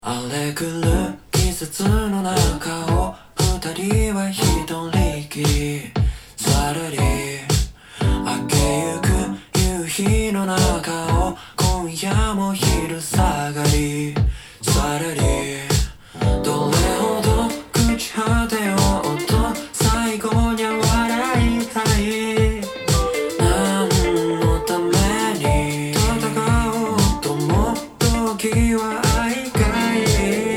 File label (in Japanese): インシュレータ有り